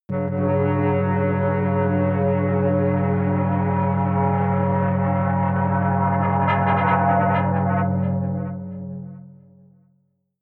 atmospheric feedback synthesizer long medium, drones